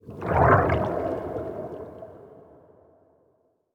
Underwater Attack Underlay

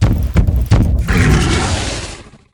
Hippo Mount Attack Underlay

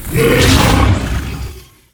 Hungry Naga Death

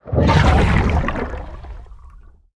Anchored Totem Death

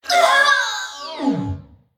Trolley Mech Death; Trembling Trolley

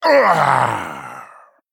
Male Troll Death

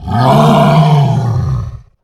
Attack; Lumbering Isle

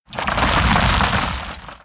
Rock Elemental Play

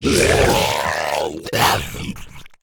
Chameleos

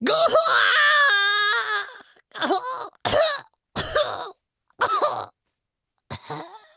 Death

